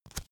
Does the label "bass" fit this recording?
No